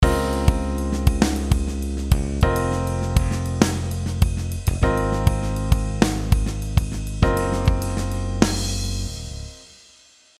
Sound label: quartal 7